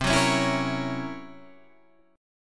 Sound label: C 7th Flat 5th Flat 9th C, chord